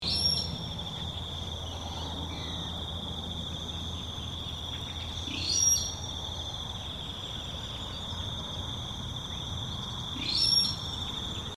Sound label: Banded rail